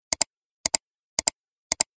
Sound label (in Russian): klik myshi zvuk